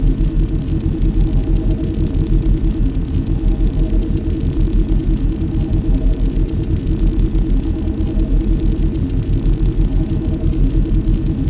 amb swoop idle; Star Wars Knights Of The Old Republic II; XBOX

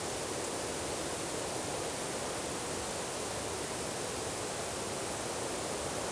wind trees ambience; Star Wars Obi Wan; XBOX

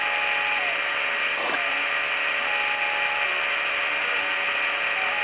hologram loop

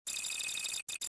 fuelpump; Star Wars Jedi Knight II Jedi Outcast; XBOX

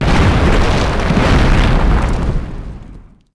dirt rock landslide; impacts explos

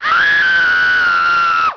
scream; Chase Hollywood Stunt Driver; XBOX

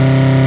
CIVIC rpm, Engine; Cars; XBOX

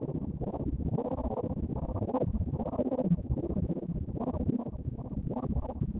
tunnel

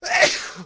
snooze, CHURCH; AMBIENT; Pirates Of The Caribbean

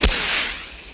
geyser shoot; Soultree

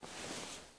body slide gritty, foley

